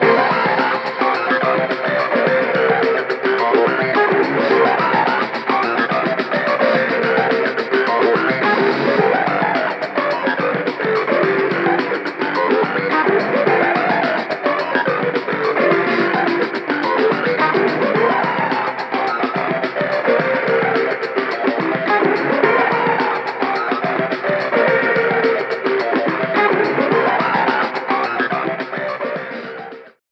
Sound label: BoomBox loop